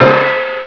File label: Balinese; HyperComposer DEMO